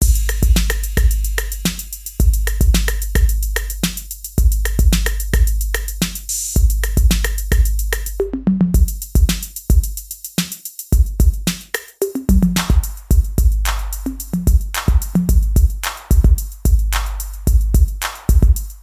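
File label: tape 808 bass thickener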